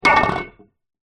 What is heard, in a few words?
metal pole med heavy drop; foley